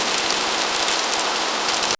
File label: soft wind whistling throu; empty space